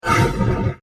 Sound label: Whoosh Warble; Production Element; Whooshes (Sound Effects:Sound Design)